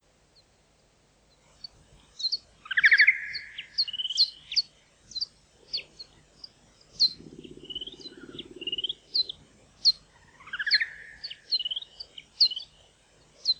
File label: pipistrellus nathusii; batsongsters